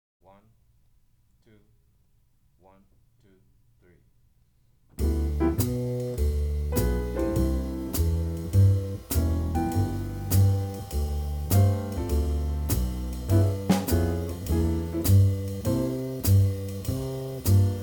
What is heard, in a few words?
g blues